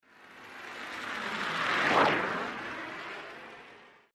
Blow Torch By At Medium Speed, With Lots Of Air Ripping